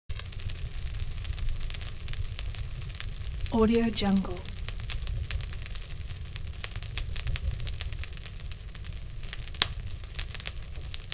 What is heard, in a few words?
campfire